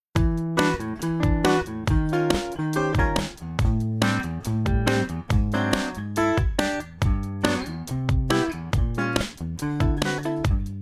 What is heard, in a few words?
Flash Jazz Bash